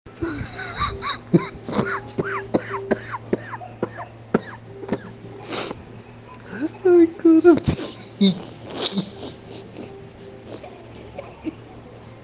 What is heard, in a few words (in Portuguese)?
risada